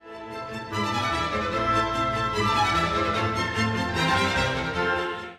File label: Credo Frenetic orchestra